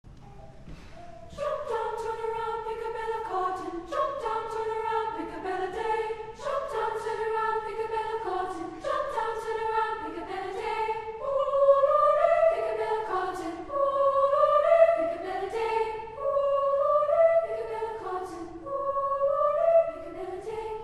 Pick a Bale of Cotton, lyrique accappella, Women's Choir; Sequoyah Chorus